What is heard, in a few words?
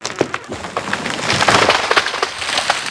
pole creak; World of Tanks Blitz